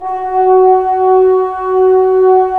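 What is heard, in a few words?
brass trombones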